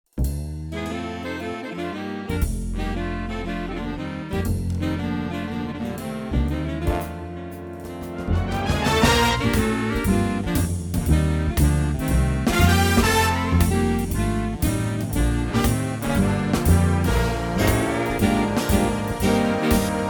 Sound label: Big Band